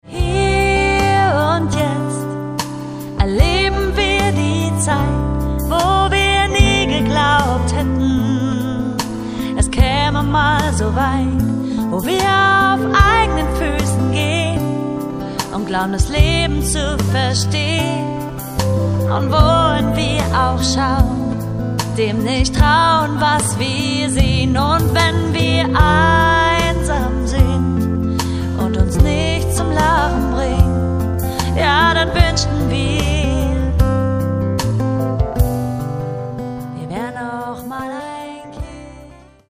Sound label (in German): Lachen